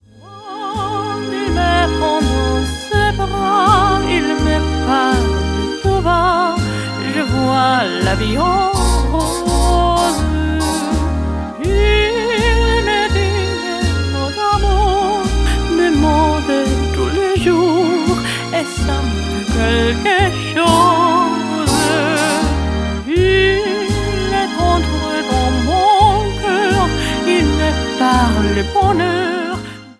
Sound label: Vocal